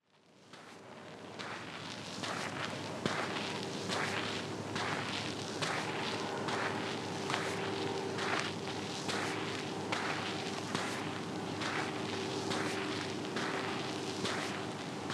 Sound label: Footsteps on Damp Sand